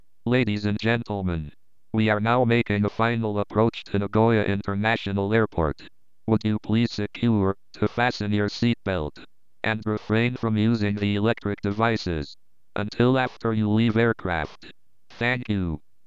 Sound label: synthesizer